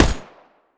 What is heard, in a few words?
smg fire; weapons; npc; smg1